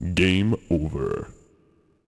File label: game over; Stake Fortune Fighters; XBOX